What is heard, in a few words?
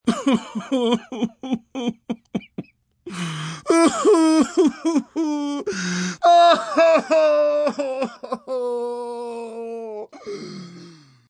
Cry Wailing, Cry, male, wailing and gasping, pain, hurt, sad (human voice); Crying Sound Effects